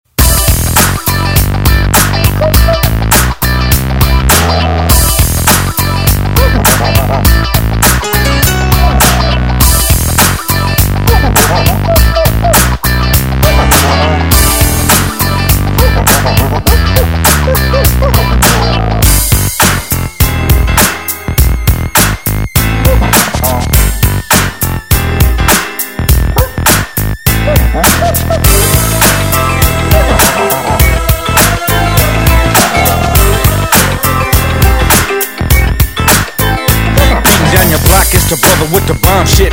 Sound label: funk remix ring tone